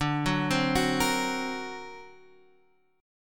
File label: D Augmented 7th D down; D+7 arpeggiated; JGuitar